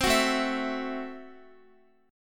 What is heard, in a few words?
A Minor 6th A Guitar; x,x,10,11,9,11; strummed; Am6